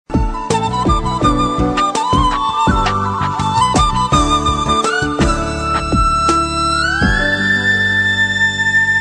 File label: cool signal ringtone